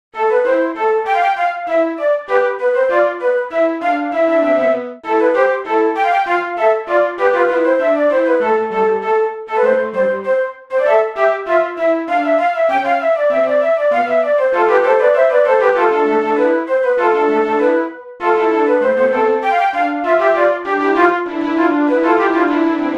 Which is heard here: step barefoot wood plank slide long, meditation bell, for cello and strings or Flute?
Flute